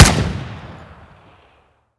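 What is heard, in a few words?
machine gun; halflife; hgrunt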